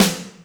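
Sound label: Snare Chrome, Alesis D4 drum machine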